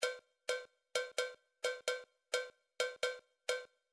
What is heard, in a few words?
individual drums cowbell